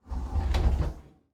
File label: Door Close Bathroom Closet; household